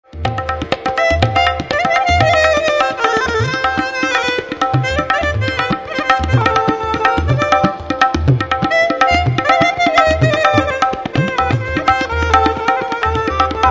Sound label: palli geeti, tribal india